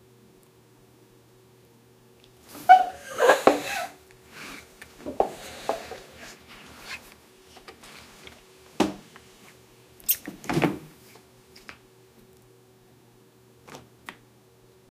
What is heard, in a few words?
sound of a woman in, sofa